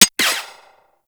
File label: Sniper Mech; Warframe; Tenno